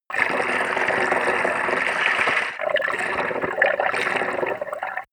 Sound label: bathtub fill; haus garten; badezimmer